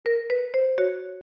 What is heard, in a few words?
xylophone